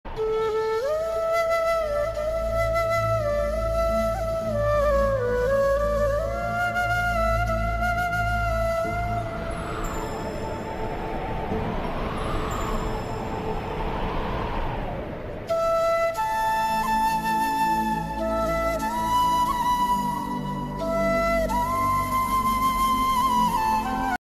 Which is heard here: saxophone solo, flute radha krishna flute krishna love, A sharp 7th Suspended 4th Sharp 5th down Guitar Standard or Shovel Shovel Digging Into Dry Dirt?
flute radha krishna flute krishna love